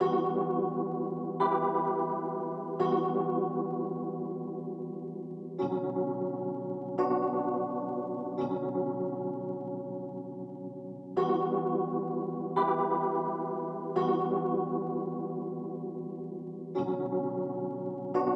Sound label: 172 BPM B Min Rhodes; RnB; Melody; Loop Stems; Cymatics; 2022 Melody Collection; Houston Dancing